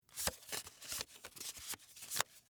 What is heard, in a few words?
Game Cards, Shuffle, Stack, Small; Sports, Board, Game, Cards, Shuffle, Stack, Small, Chance, Cards; Board Game Sound Effects